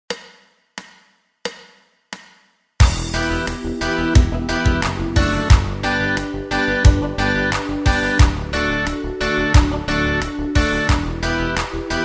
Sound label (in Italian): esercizio ritmico